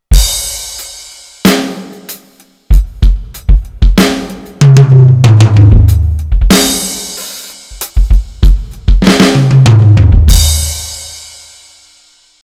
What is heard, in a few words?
Roland TD-4KP